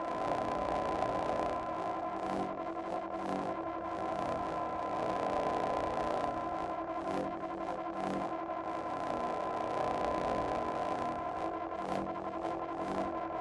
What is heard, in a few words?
static holo sign; angel city; Titanfall 2